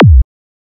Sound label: Kick